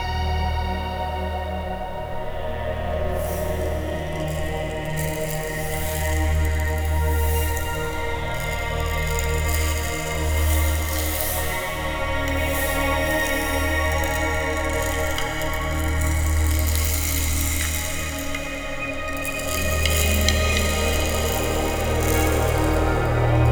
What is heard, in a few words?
Synvoxperc Scape; Sonic Foundry (Sony Creative Software); Ma Ja Le; Soundworlds